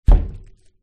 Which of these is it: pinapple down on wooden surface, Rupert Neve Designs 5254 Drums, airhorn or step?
pinapple down on wooden surface